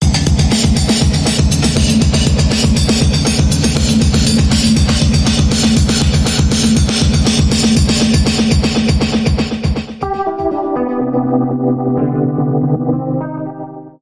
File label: Grounded Dance Bumper Reversed